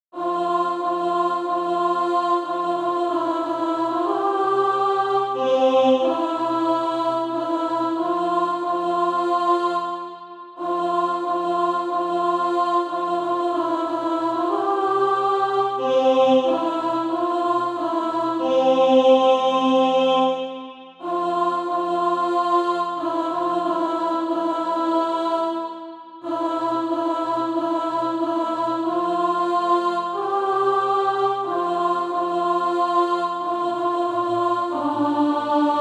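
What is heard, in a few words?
alto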